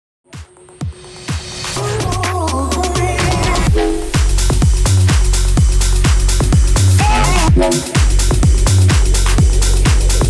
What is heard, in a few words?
126 BPM